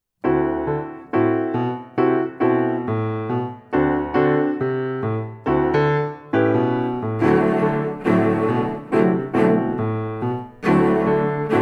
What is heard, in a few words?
Autumn Blues (Cello)